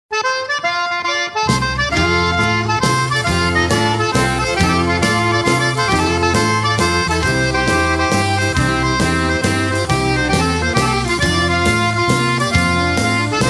waltz

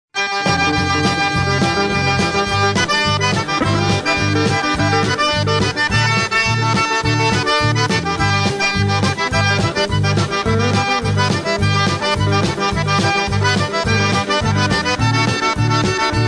Cajun Melody

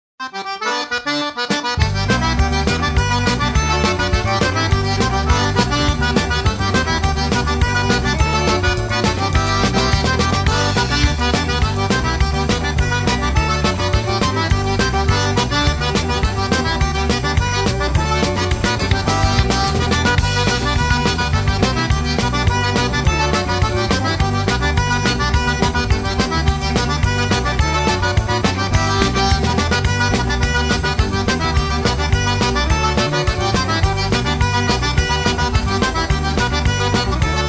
creole stomp

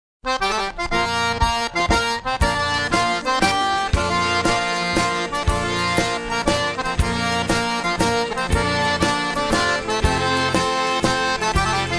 old fashion waltz

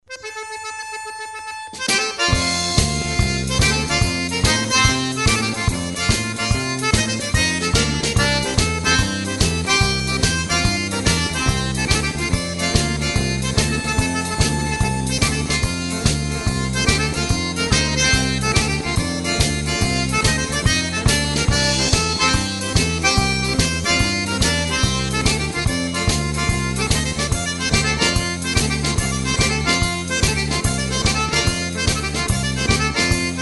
french blues

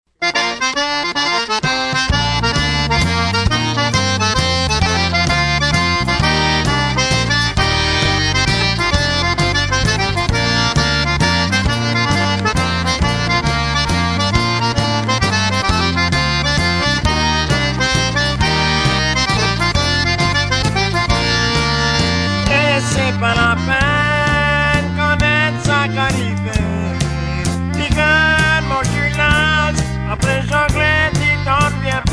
Waltz

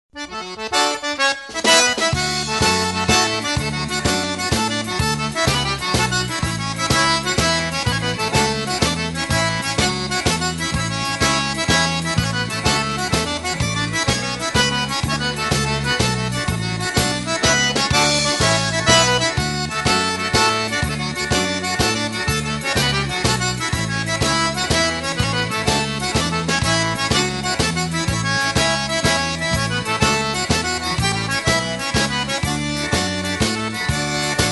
waltz

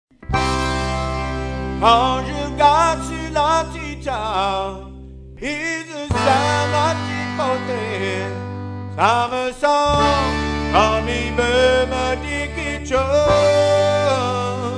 Waltz

